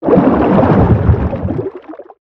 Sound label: creature swim fast; shadowleviathan